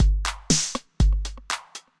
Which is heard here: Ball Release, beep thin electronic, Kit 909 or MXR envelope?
Kit 909